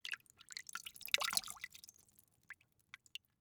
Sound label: Liquid Water Scoop Then Dripping In Metal Bowl